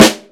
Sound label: Snare ff; EMU MoPhatt